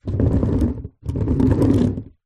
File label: leisure rollerskate short roll wooden deck